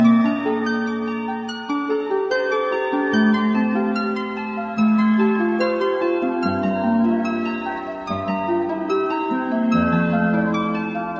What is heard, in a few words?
fairy; zelda